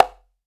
STEREO CONGA; Cuba

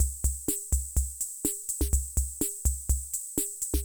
Loop, classic machines; arranger

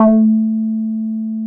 A3, Bass; E-MU Producer Series Vol. 6 – Analogia Project (CD 1); Boom da Bass 2

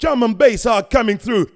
VOX SUNG; Megabass Remix! 2

